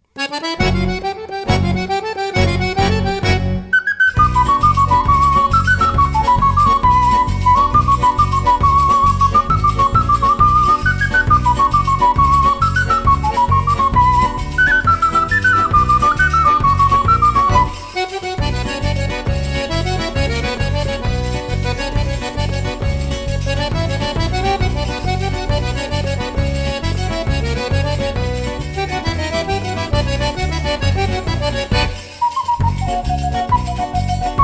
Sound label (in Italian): TARANTELLA ZUFOLETTO